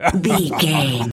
voice fun man character deep laugh

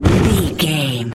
fireball blast projectile spell

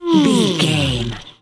comedy siren whistle fun down

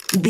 movie camera vintage lever

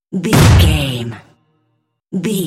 cinematic stab hit trailer

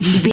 voice male b effort quick action

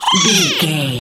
goblin fairy attack

